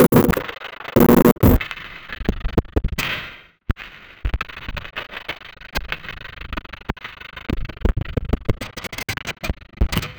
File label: glitch drums ambient soun, experimental